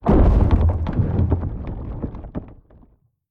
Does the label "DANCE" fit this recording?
No